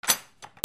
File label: DOORS